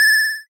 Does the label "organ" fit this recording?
Yes